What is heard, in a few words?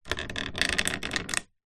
household lamp wall mounted metal bendy arm movement bend creak; zapsplat